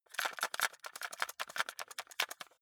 cassette shake long

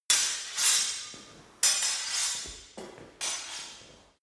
sword hit sword sequence

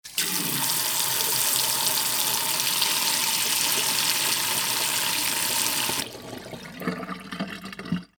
water tap and drain

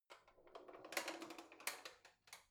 old drawer creaky soft in

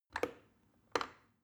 plastic button start click regular slow

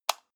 light switch off

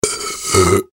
belching

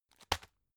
tissue package drop soft

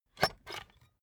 puncher squeak punch bulky

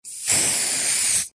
bathroom spray

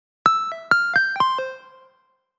pling plong

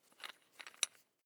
electric drill bit unfasten wrench turn

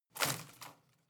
home plant leaves rustle hit

